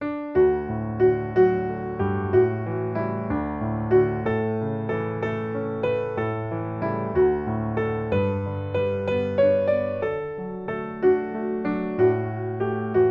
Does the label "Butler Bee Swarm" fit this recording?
No